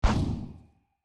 Bomb Destroying; my cute commissar 2020 gamerip

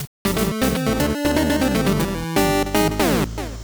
Stereo, Stage Clear; super spy hunter; nes

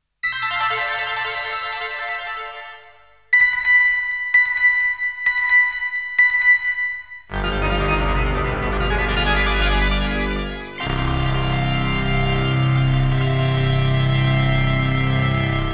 Stage Clear; Akumajo Dracula X; Stage Clear with the Red Crystal (Clear Demo - Pattern Clear BGM)

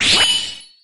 Shring

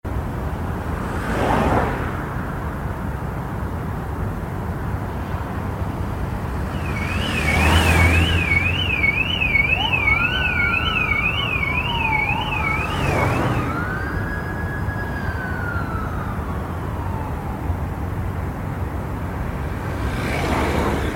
Amb Street Lonely

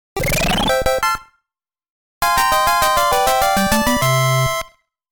Miss ~ Game Over; Game Sound Museum Namcot; Babel no Tou; Sky Kid; Metro Cross

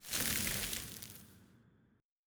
Object Grow Flora; LevelEditor

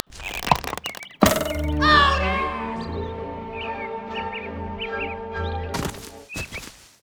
Slingshot Overpower Music; barnyard; playstation 2

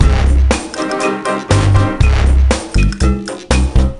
Flourish; gamerip; Happy Feet Two; nds

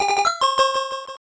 Coin Sound; arcade; mr goemon

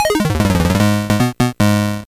Player Out Normal Game Retro; bomberman party edition

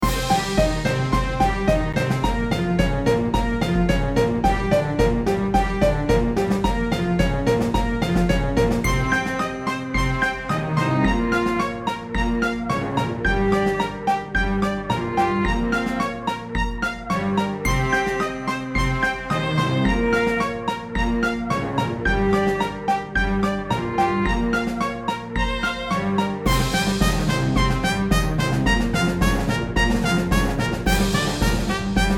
Orchestra

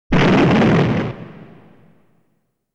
Explosion (Small)

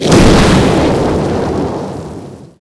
Weapons Fireball impact

